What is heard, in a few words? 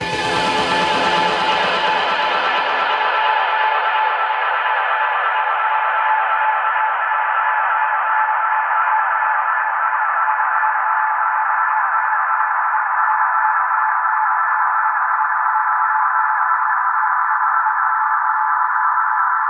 Reverb Athmospheres, FX Vocals; Vengeance Samples